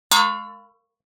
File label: Metal Pan Ping Hit; Hollywood Edge; sound designer's toolkit v2